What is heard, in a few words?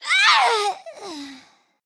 Female Gnome Death